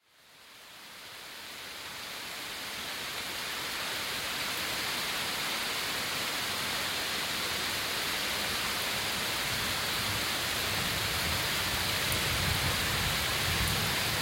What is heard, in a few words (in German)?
Gewitterregen; Sommer; es singt und zirpt sommer